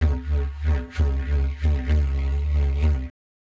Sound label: ethno, 125